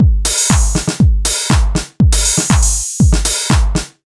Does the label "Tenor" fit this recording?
No